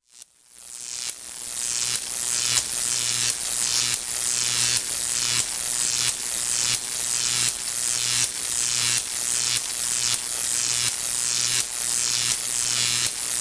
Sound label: High Voltage; Halloween Havoc - Sound Effects; Ktel